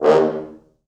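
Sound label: 4 French Horns, Horns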